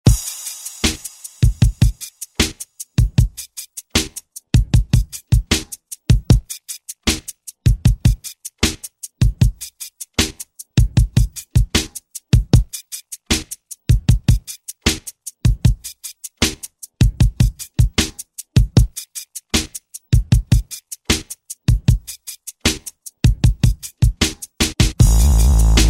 Hip Hop; DJ Mhark; Straight Kutz

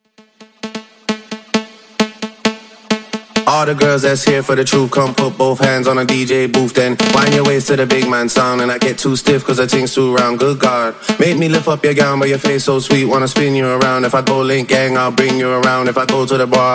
Instrumental Intro 132bpm

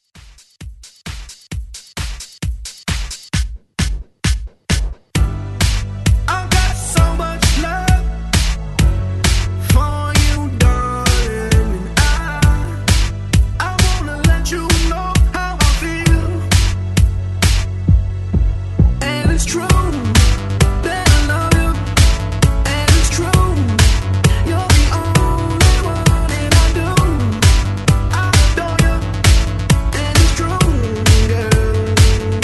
HOUSE REWORK, 132 BPM

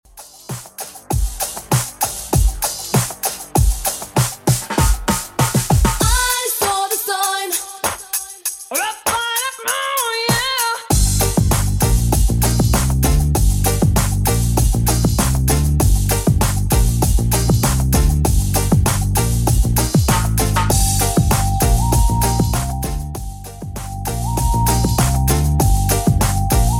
90s Redrum 98bpm

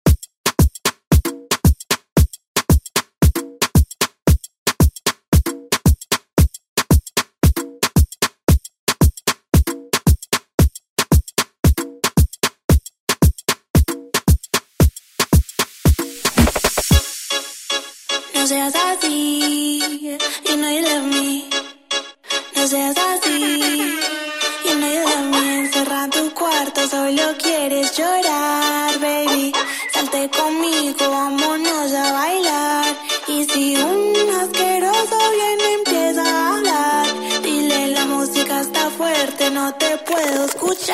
Latin Urban Redrum; Deejay Hit; remixplanet

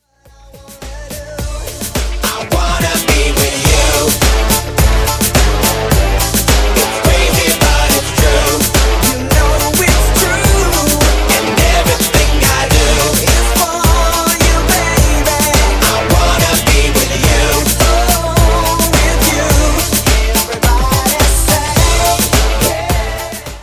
POP OLD SKOOL; END of YEAR

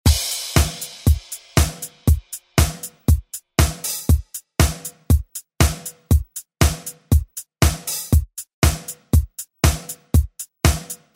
ReDrum; DJ Mhark

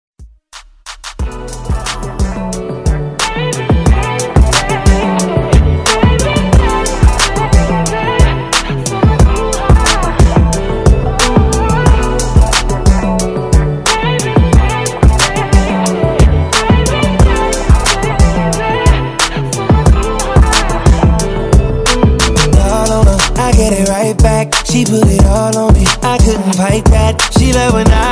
Top40 Redrum

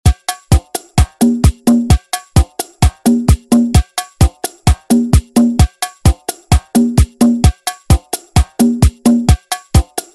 Bachata Latin Redrum

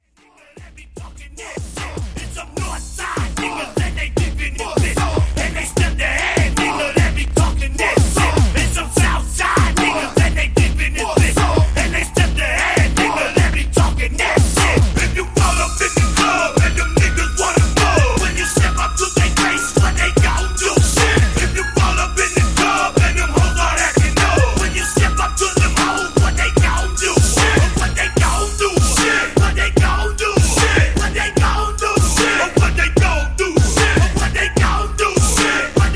150Bpm; Redrum

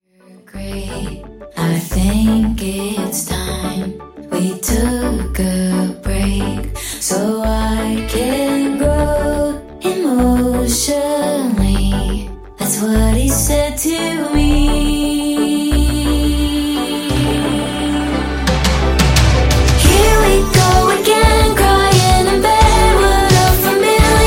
Redrum 87bpm

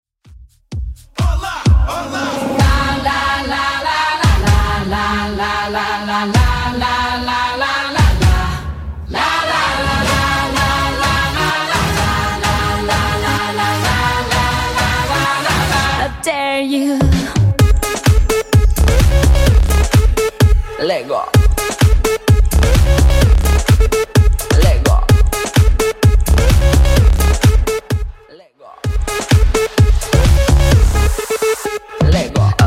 128bpm

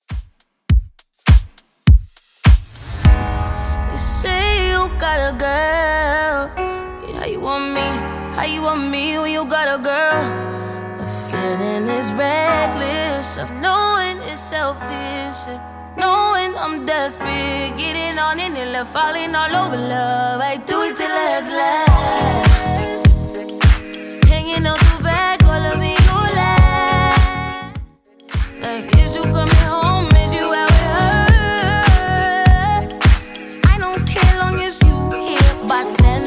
Top40 Funk Wav Redrum 102bpm

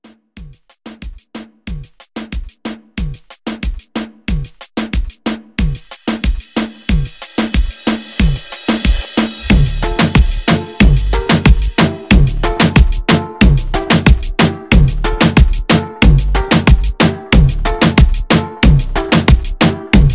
Latin Reggaeton 92

